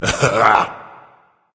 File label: ugh berserker; cuchulainn